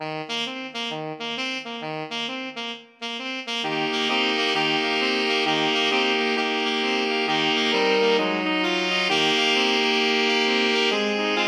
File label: jingle bells boogie alto sax, alto sax quartet